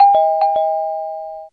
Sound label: Assist Block